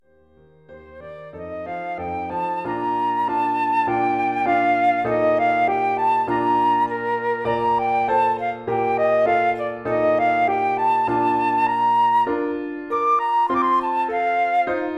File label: CLEMENTI SONATINA in F Op 36 No 4 2nd mvt flute line